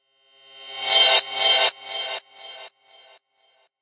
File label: reverse echo cymbal; Cymbals; single hits